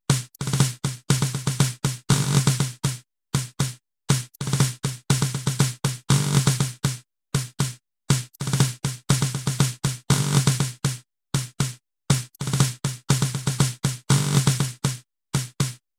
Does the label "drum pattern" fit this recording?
Yes